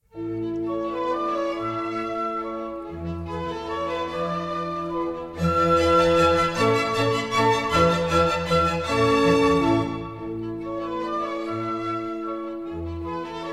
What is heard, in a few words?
German Dances, A major; Mozart; Dances and Marches